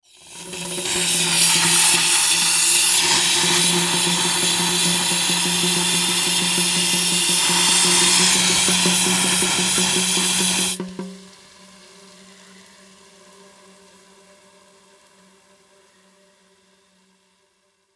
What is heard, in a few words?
Industry Tool Air Duster Spray Hollow